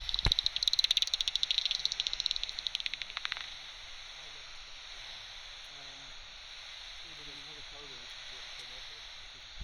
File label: bats